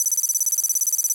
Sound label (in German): Klick